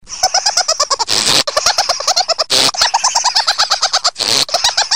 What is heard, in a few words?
Crazy Cat